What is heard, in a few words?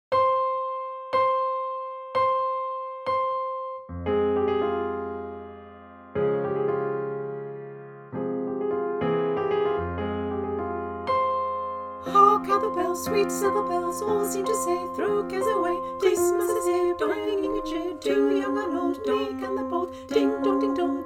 carol of the bells full vocal mix